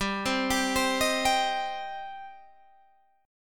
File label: G Suspended 4th Sharp 5th G, down, Guitar, Half Step Down